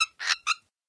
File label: Quail Mom Panic; farm mania 2